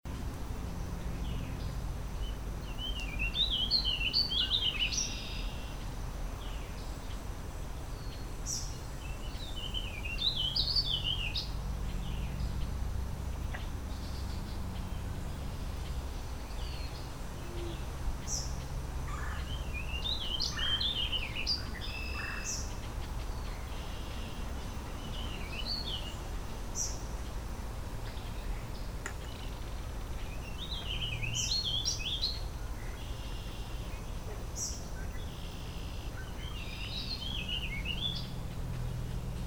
Warbling Vireo

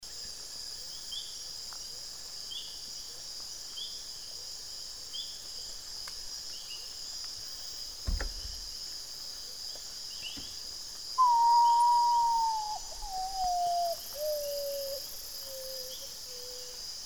Common Potoo; Burbayar Panama

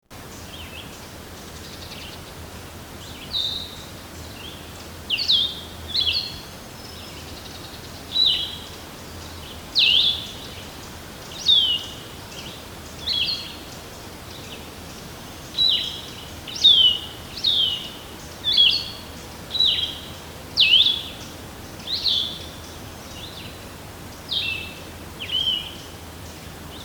Blue-headed Vireo, Garrett MD

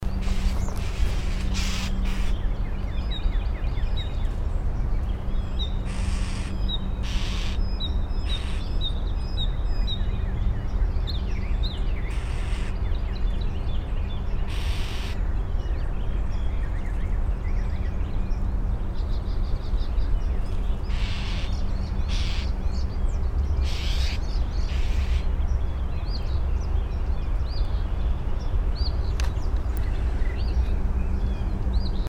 Northern Mockingbird Chastize

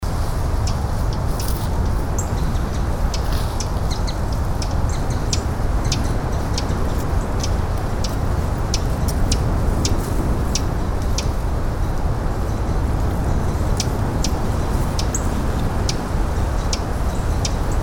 Fox Sparrow Chipping, MD; PLSP MD

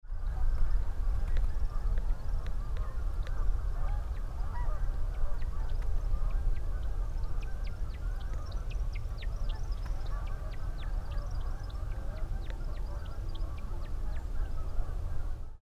American Woodcock Twittering; Pickering

